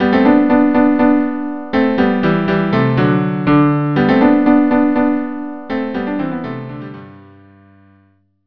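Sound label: cello cello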